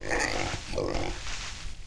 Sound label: dig